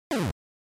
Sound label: NES General Pitch